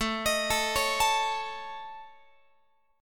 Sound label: A Major Flat 5th, Guitar; AMb5; arpeggiated; x,17,18,19,17,20